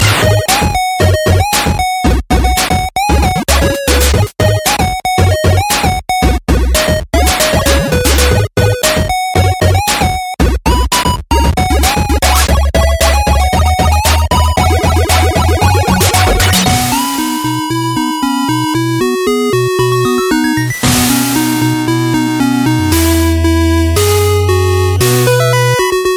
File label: 8 bit theme; rainbow dash